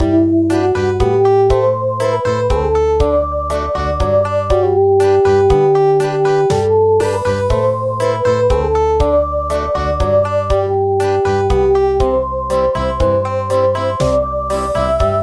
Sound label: Country; Muran of England